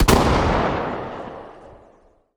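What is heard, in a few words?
xm1014 distant, shotgun; arccw go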